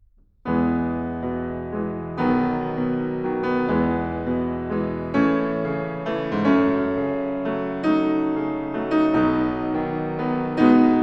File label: E major: Largo, piano; 24 Préludes, op. 28 no. 9